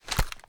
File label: reload empty fast waffle end; reloads; gmod; iw8